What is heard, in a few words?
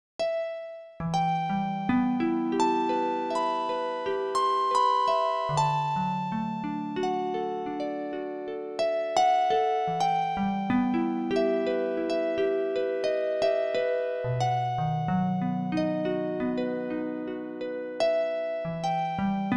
Green Sleeves G minor